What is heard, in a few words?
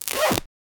equip leather bracers; sfx